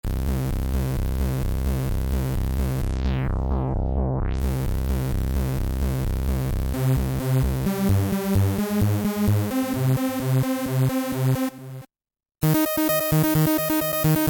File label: Dance Xpanded Arps Electro, MOTIF